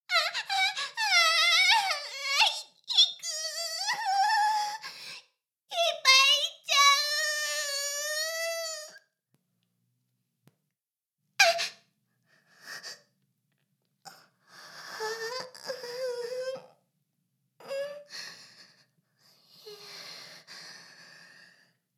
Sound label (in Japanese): Ｈな音声; ASMR; ダメなメイド